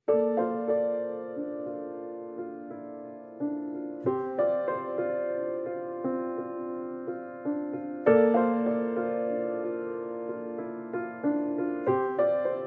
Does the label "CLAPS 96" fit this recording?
No